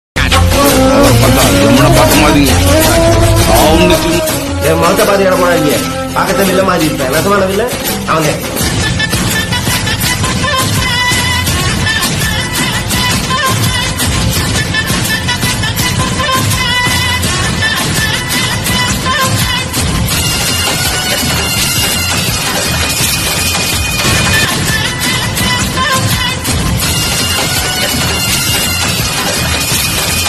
tamil climax bgm; vadivelu villan status; vadivel gethu status; pudhupettai

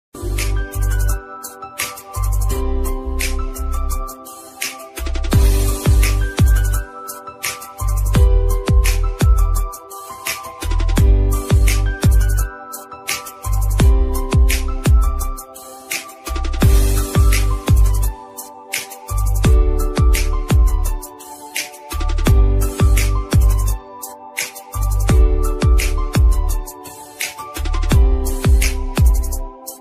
Kannada new ringtone music

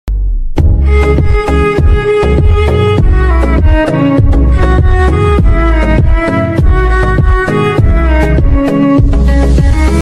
english ringtones bgm ringtone

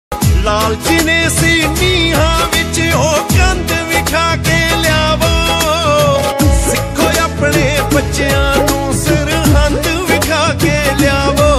punjabi dharmik song ringtone

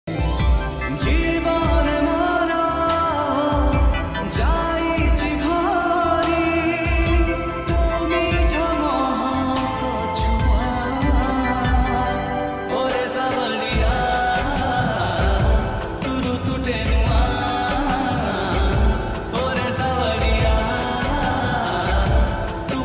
odia; odia ringtone

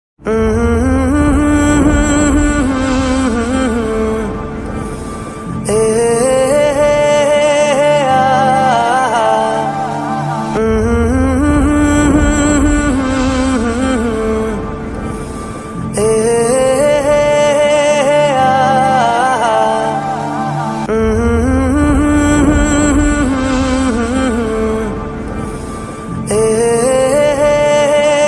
instrument ringtone; tadap

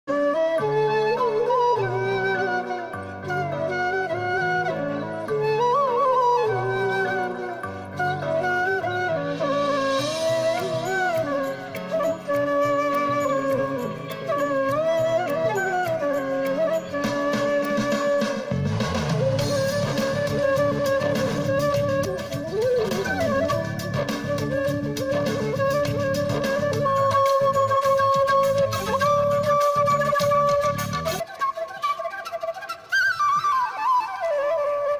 flute music

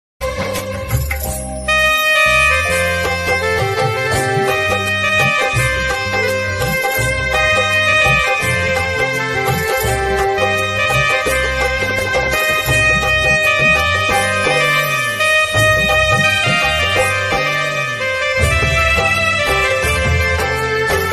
no copyright music gujarati mix

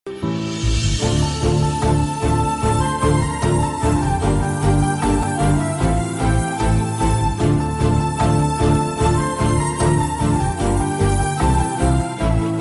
movie bgm ringtones; majnu; natural star nani; anu emanuel; telugu

